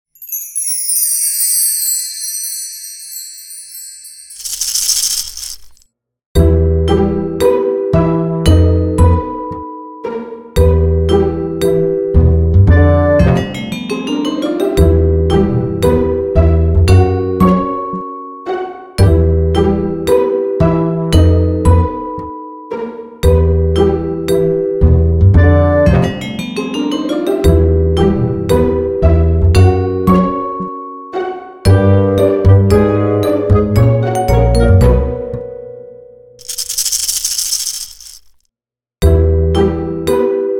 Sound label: ComicPizz; Comic 드라마